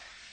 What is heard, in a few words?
short slide